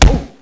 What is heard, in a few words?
man hit